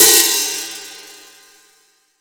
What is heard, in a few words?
FLANGE 14 HH